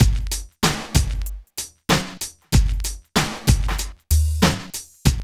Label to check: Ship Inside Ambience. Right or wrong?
Wrong